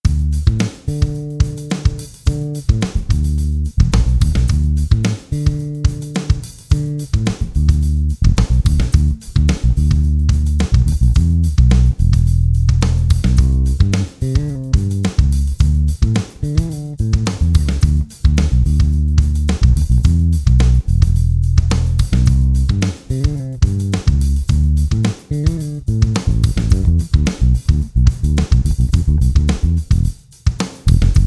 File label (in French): Basse Batterie